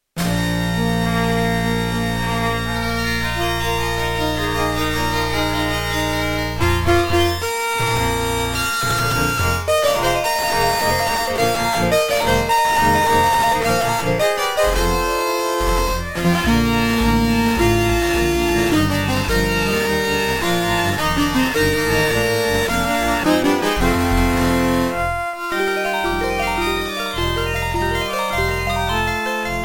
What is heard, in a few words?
Beepblaster 2.0 SYNTHMODE; Dark Forces